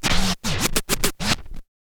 scratch kit, vinyl; scratches; Masterbits; Vinyl Attack

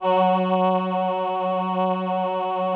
VP-330, String Machines

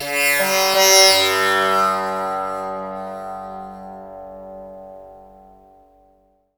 TAMPURA; Spectrasonics; Heart of Asia

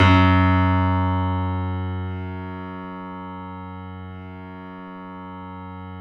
F#, 3D Pianos, Boesendorfer; E-MU Producer Series Vol. 5; 3-D Audio Collection

